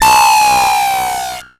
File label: Title Transition Descend; Production Element Title Transition